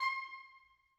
oboe stac C6, Woodwinds; BBC

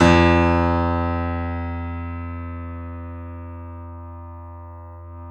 C7 F, The Ultimate Piano Collection; East West

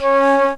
WOODWINDS

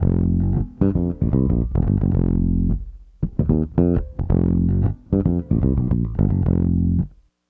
Raw Funk Bass, 70s Analog Funk; Sonic Foundry (Sony Creative Software); What it Is! 70s Analog Funk

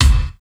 bass drum, Drum & Bass, 160 BPM; Ueberschall; Drum & Bass Resonance

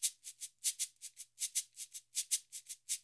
SF LOOPS 80, NYC Percussionworks; Sampleheads

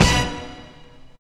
BRASS HIT

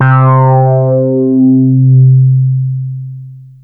FUNKY, BASSES; Masterbits - Vintage Voltage; VV BASSES; FUNKY PAVE

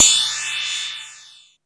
CRASH FLNG, ANALOG; Back In Time Records; Big Bang

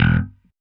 Happy Pub Bass Ending, Indie Rock; Sonic Foundry (Sony Creative Software); Indie Rock Anthems; Session 05 - Happy Pub

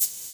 DANCE SET, DRUMS

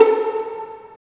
STR VLN PI, String Vlns Pizz; Roland - String Master Series; Vls Pizz

